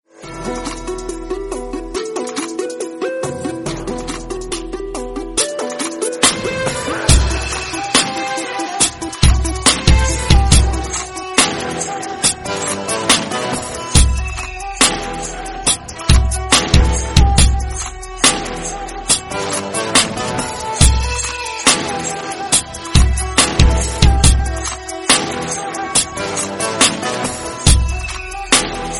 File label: Instrumental